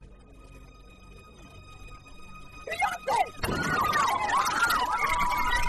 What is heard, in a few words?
boom